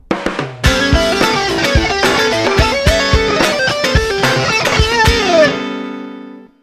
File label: arpeggio, guitar